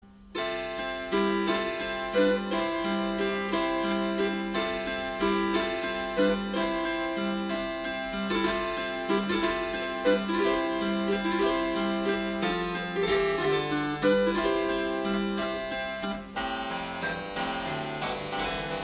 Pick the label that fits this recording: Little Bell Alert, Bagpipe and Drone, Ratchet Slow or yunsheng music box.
Bagpipe and Drone